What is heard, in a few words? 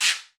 Guiro Wood; Club-50 - Foundations Roland